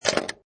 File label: Pinball Sound Mechanical Movements; Video Games; Sound Effects:Games; Lynne Music